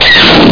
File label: blast